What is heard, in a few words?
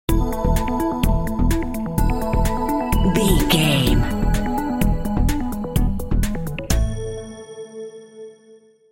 future technology stinger